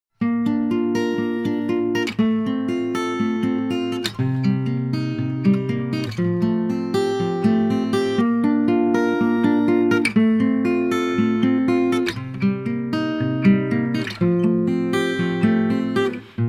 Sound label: Placid, ringtones